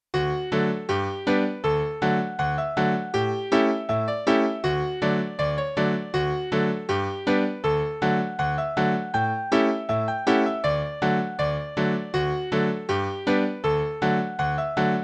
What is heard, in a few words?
Jenny Lind Polka; tune for the Lancashire Rose Dance; Newburgh Lancashire Rose; Malkin Morris